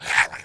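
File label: damage dragon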